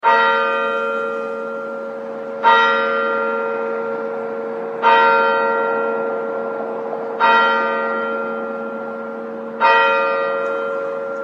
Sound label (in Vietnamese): Tieng chuong nha tho